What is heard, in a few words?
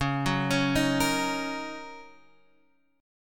Gb 6th Db